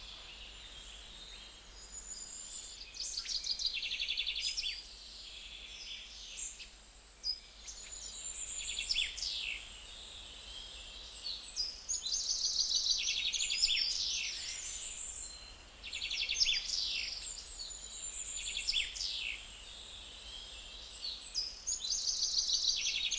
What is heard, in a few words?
Misty Mire ambience; Loop; Dungeon Defenders; 2011 pc gamerip